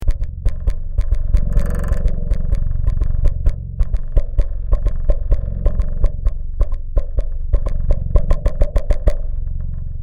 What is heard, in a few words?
Percussive Use, Spring Reverb; 3D-Printed Spring Reverb Microphone